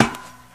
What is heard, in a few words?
ladder step; Golden Crayon Sounds